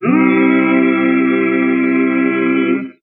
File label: barbershop quartet